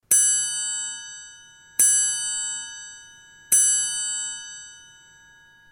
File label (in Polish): Trójkąt